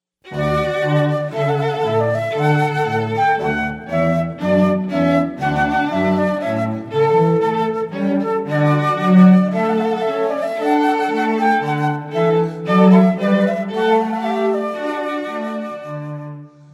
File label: trumpet